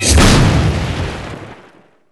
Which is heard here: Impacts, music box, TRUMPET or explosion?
Impacts